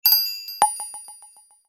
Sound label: time gain sfx